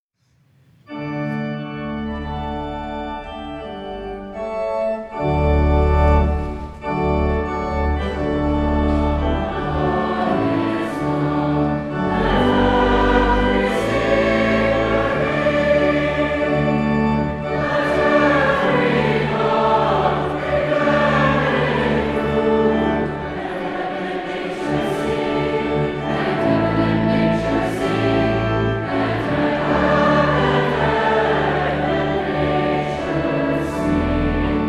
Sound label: Joy To the World Audience Carol